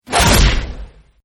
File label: round score slide in